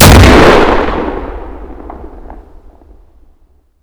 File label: Inf Weapons; Red Orchestra 41-45